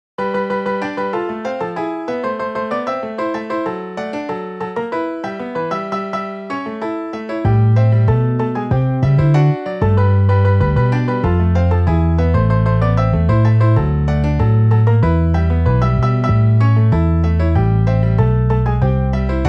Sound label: Piano Bass MIDI